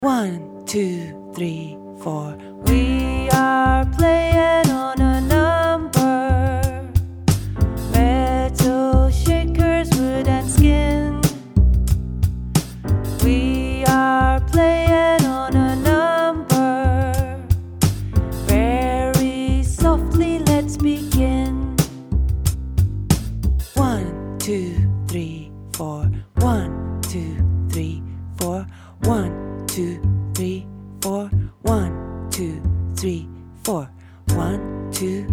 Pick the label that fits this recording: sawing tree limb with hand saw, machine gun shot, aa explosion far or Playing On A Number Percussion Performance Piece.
Playing On A Number Percussion Performance Piece